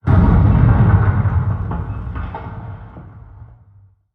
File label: Structure Blunt; Barotrauma; Room FX